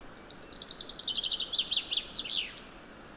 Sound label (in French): Pinson des arbres; Issaux